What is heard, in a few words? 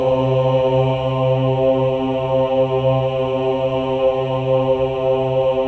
OH C3, CHOIR OHS; Spectrasonics; Symphony of Voices